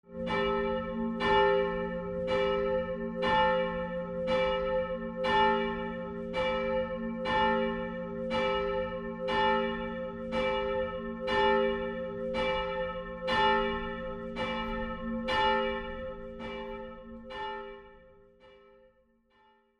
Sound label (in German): Pommernglocke